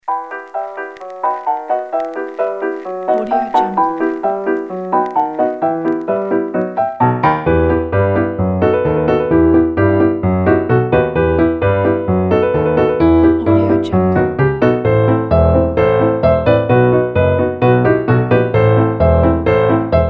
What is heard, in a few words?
silent movie saloon piano; animapiano